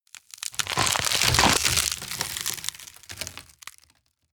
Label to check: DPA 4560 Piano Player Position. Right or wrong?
Wrong